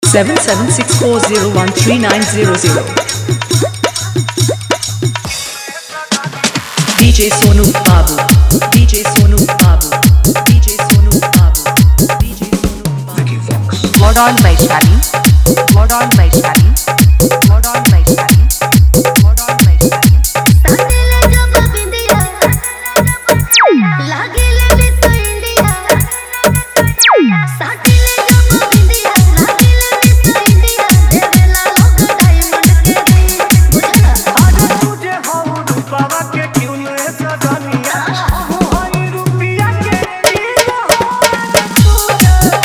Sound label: Bhojpuri DjSong Hard Dhollki Bass Mix